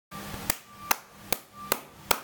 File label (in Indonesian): suara tepuk tangan; human